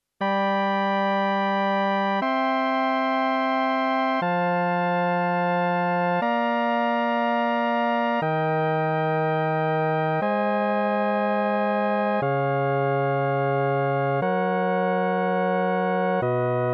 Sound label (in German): mitteltoenige stimmung